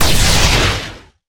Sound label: blast; ufoai; weapons